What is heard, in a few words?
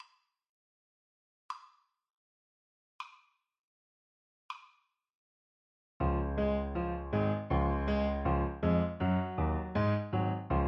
hummel ecossaise, Saxophone